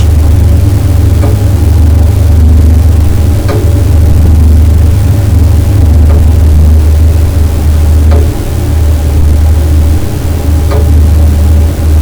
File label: recording radiator small mic amplified